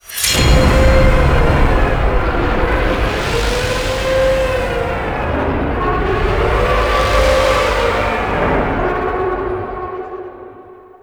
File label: Defense Start Waves; Missions; Duviri Undercroft